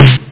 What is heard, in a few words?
snare maker; OctaMED SoundStudio V1; drumkit; snaredrums